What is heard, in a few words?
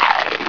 bite head; mk2; Reptile